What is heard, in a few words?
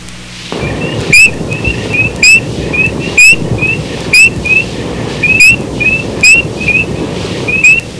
peeper, frogs